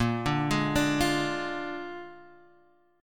Bb 7th Bb down Guitar Drop D

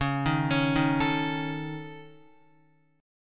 Db Suspended 2nd Sharp 5th Db Guitar; arpeggiated; Dbsus2#5; JGuitar

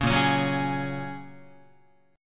A sharp Minor 7th Sharp 5th, Guitar; strummed; 4,6,4,4,5,7; 4A,4E,5A,5D,5G#,6C#; JGuitar

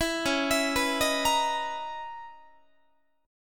Db Minor 13th Db Guitar; Dbm13 arpeggiated; JGuitar

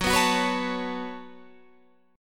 C sharp 7th Suspended 4th Sharp 5th F sharp

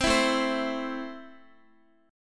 A Minor, Guitar; x,x,10,9,9,7; strummed

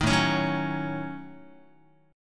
A 6th Flat 5th A Guitar, chord; strummed; JGuitar; 6,5,4,4,5,x

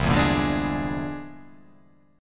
C Augmented 9th

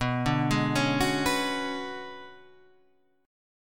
B Minor 6th Add 9th B; Bm6add9 Chord {7 5 6 6 7 7} arpeggiated

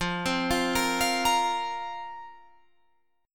F Suspended 4th F, Guitar Drop D

